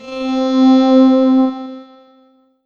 Metal Pad C4; Korg NS5R

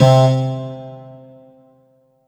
Korg M3R C3; Don Quixote

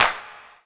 Korg N1R Clap

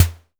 Kick Snare

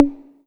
M-Audio Venom Open High Conga

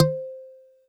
Kawai PHm Harmonics C5

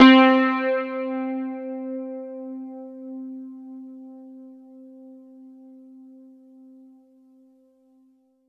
Yamaha FS1r Nu Suitcase C4

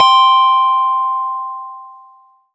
M-Audio Venom BlissBell C4

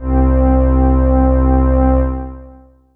Roland JX-8P Pipe Organ C4

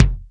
Roland R 8 Wood Kick

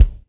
Kick Drum

